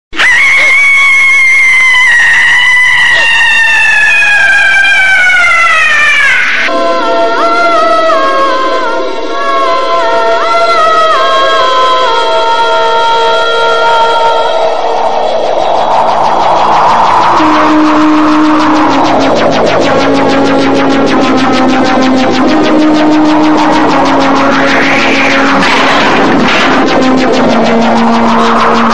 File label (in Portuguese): voz de grito de terror